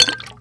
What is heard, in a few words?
potion drop; Fate; ITEMS